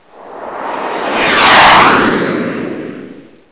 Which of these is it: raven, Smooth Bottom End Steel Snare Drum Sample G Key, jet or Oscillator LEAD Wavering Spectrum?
jet